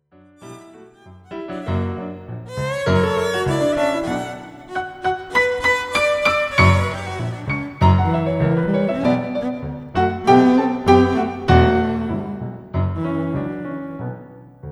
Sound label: tango canzon